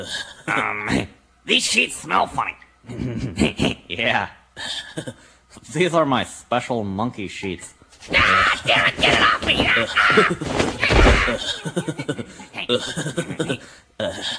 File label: lose